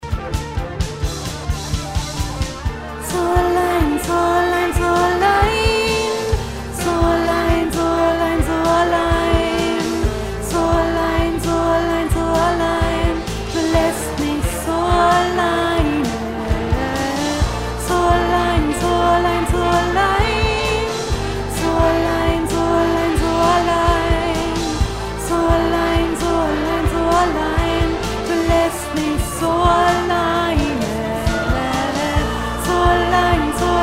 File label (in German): Chorstimme Allein mittel; Die Musik-Insel